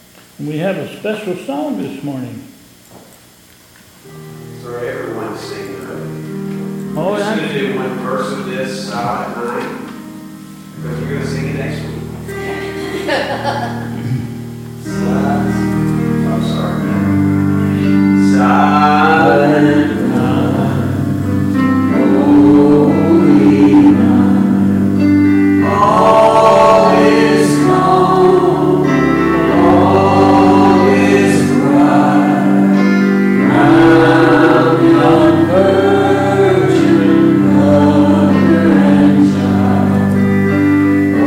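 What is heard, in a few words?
Hymn, Silent Night, church service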